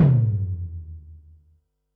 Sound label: tom, Pearl SC-40 Toms